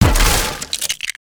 Wood Splinter; destructibles

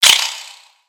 Spider Get Hit

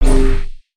scythe swing; weapons

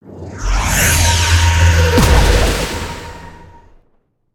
Spin, creatures organic; Skullken Inventor; aronwk collection; ndaudio

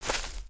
Footstep Grass; creatures pets

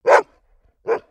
doberman bark; creatures pets

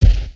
Spider Footstep; creatures organic

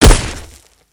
WPN Impact; creatures organic

